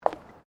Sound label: FOOTSTEPS WOMENS LOW HEELS BOARDWALK STEP